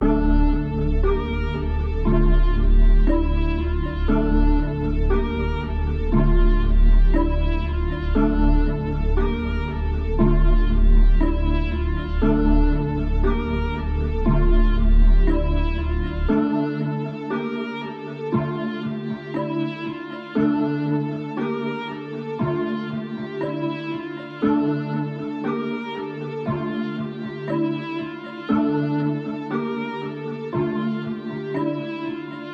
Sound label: 118 BPM D Min, Hip Hop Melodies, Orchestral; Ares